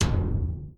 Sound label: drum